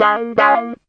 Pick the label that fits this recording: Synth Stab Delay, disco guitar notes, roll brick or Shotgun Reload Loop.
disco guitar notes